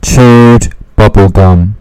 chewed

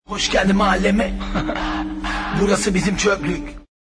follow song

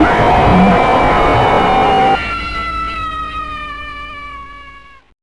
jumpscare sound; project 101

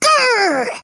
grr

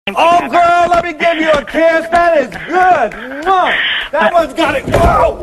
kiss

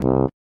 honk bruh disappointed

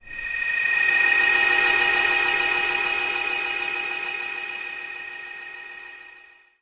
jingle; kingdom hearts

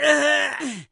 death; gnome

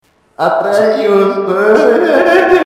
eheheh; atreyus

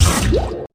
portal effect

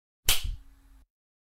slap sound effect; baldi

